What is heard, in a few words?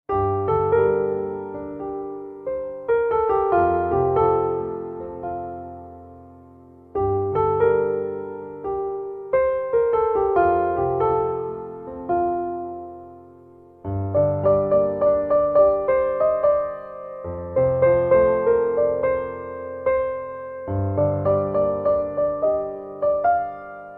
Piano Silent